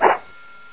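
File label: sword; sw demo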